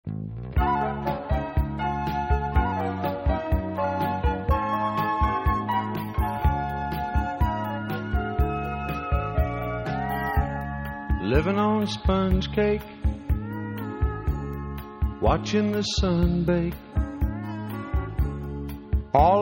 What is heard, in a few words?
Country